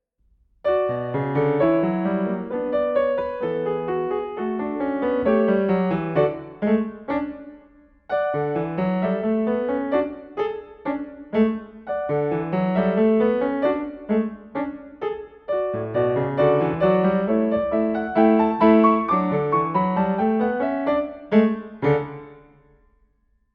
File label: chocolate treats and hot cross buns live piano accomp; eyfs and key stage 1